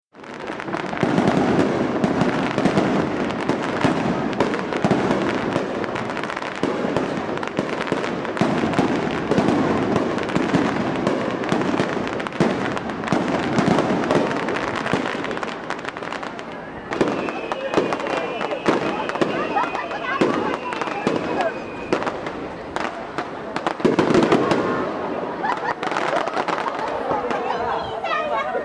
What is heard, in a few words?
fireworks